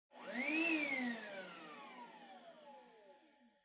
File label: Vacuum Cleaner Far Vacuum